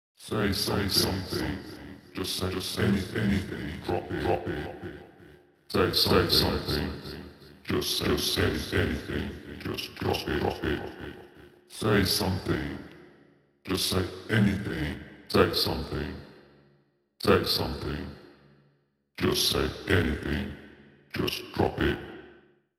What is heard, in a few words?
vocals; BPM 125